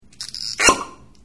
wine cork

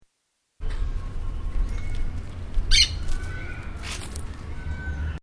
bird noise

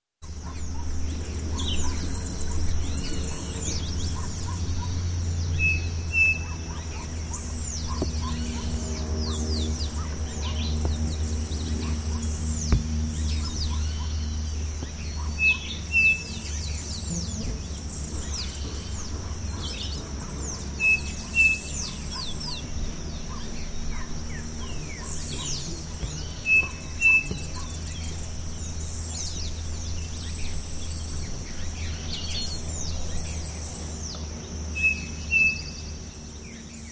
jungle colombia, environmental

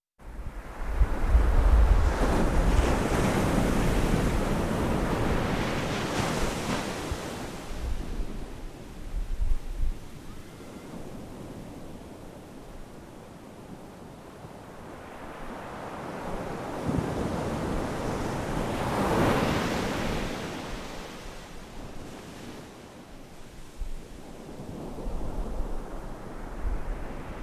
ocean baja quiet

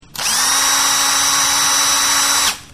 Drill Long

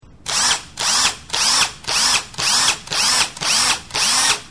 Drill Bursts

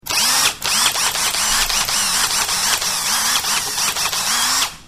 Drill Crazy

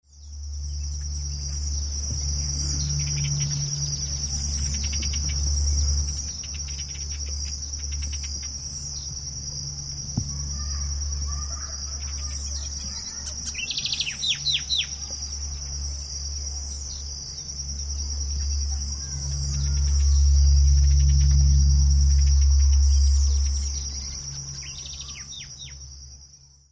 jungle night

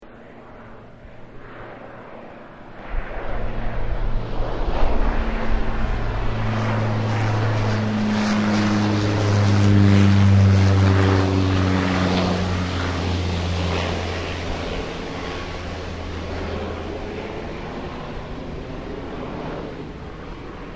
prop plane

